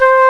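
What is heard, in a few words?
woodwind C1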